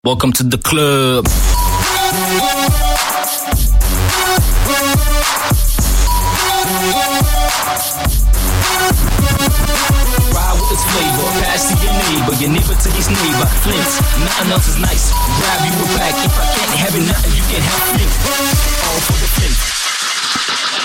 Welcoming Tune

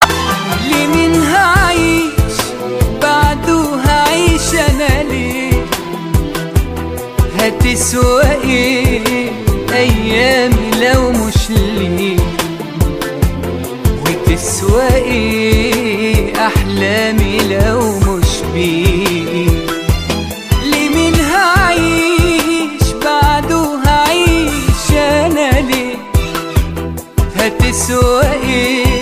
sad ring

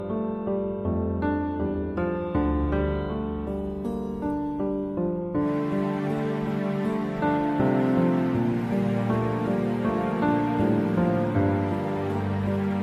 piano love

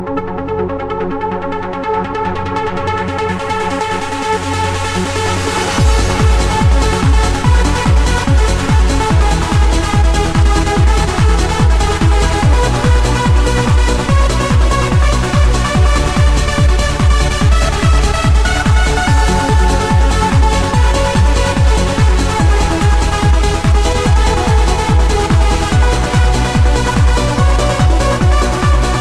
mystic techno, ringtones

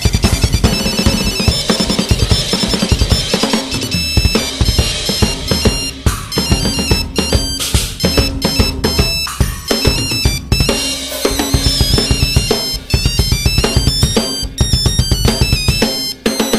drum Medley

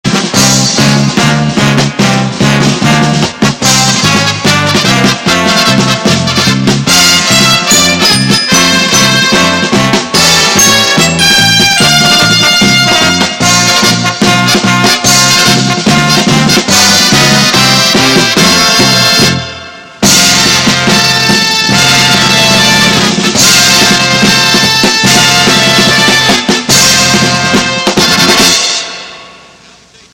march; ucla bruins

